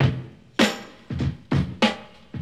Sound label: TONNEN 99; 0- 99 BPM